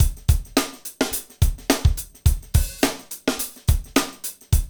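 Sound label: hip hop, Roland drum beats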